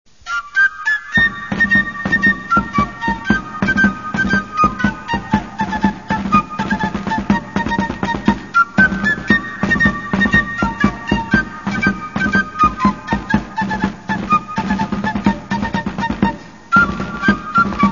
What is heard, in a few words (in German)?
Schwegel und Trommel Ausseer Hochzeitsmarsch Steiermark